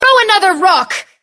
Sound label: throw another rock